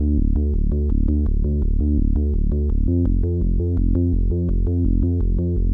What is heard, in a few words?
juno bass; recreational research foundation